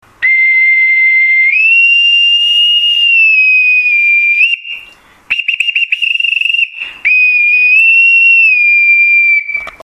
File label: bosans pipe, whistle